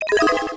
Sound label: Solved; tilerama